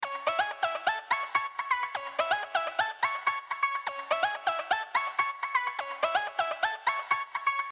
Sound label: Lead, Cm, 125; Porto